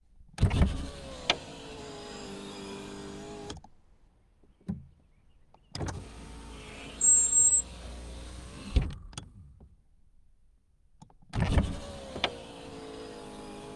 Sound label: Isuzu Piazza automatic window; Sonniss GDC